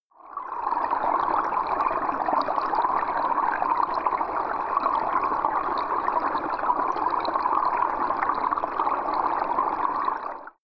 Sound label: stream